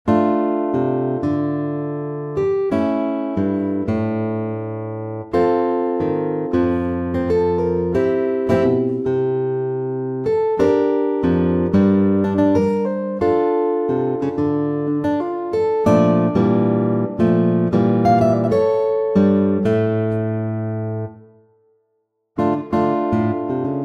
Rock with guitars; Sarabanda

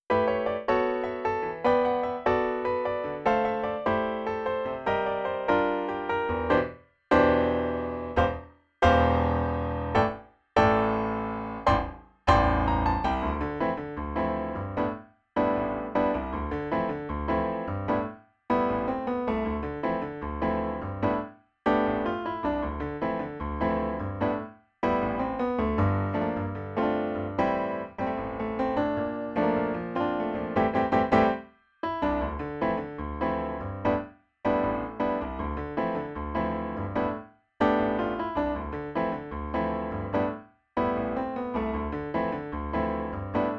jazz trio

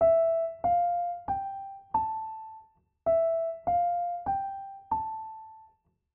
dampfer

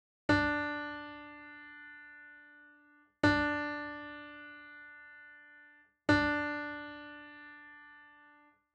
3 notes

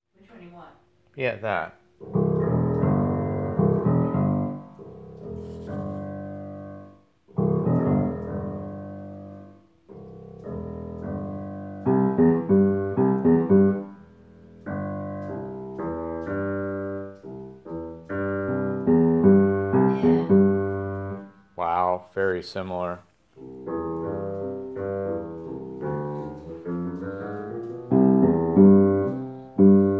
B vs real M bass

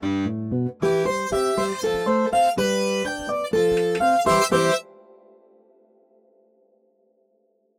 41 notes, 5 seconds

